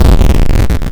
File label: explode; OpHog